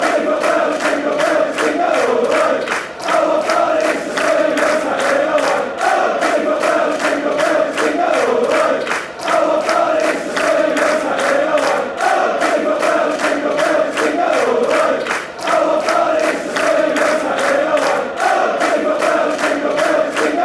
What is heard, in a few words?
jingle bells